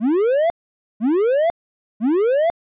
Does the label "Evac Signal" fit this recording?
Yes